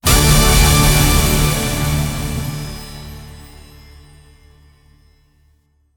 large Win; netent

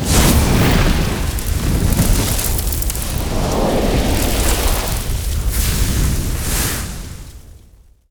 near Win

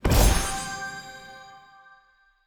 free Spin Win

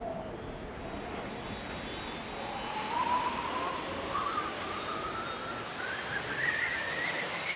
Near Win

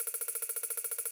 countup loop

